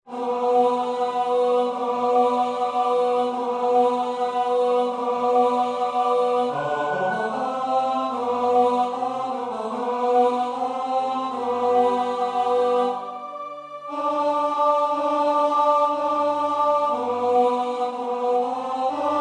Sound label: Tenor